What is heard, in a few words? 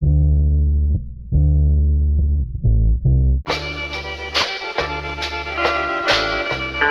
keys